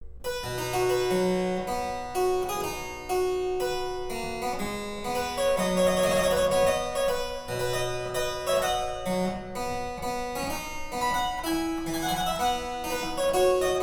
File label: Harpsichord Suite in C major Almand